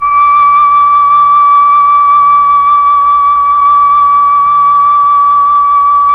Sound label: FLT, Melotron Flt, Slow Flutes; Roland LCDP09 Keys of the 60s and 70s 1